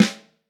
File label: Vintage Snare Drum; 5.5X14; Q Up Arts; Johnny.C's Vintage Snare Drum